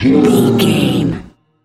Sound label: troll monster attack slow